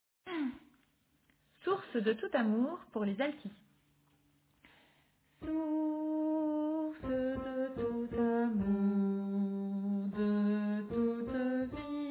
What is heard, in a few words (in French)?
Source de tout amour Alto